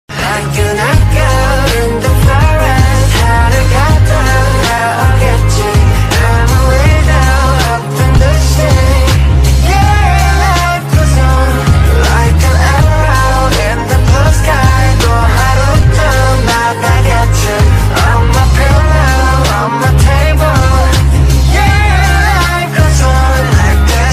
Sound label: korean ringtone